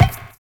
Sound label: DISCO TRI; Univers Sons